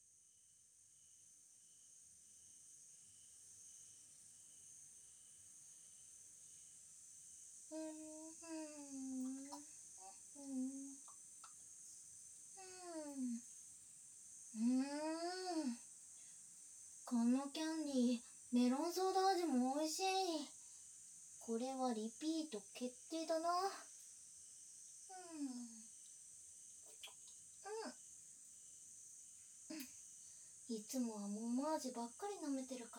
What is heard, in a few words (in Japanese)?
サボり（キス・乳首舐め・耳舐め手コキ）; せんせ、だいすき